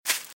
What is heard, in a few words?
Velociraptor - Footstep - Grass - Run - Right